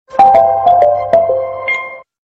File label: Best Descending Tone